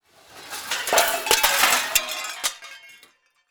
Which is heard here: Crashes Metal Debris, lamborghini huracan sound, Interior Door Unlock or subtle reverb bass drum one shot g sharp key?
Crashes Metal Debris